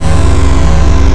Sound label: quad fire; xatrix; items